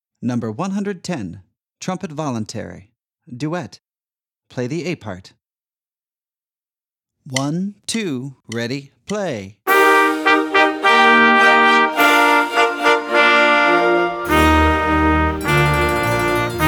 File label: trumpet voluntary duet